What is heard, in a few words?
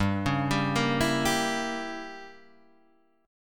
E Diminished 7th G, guitar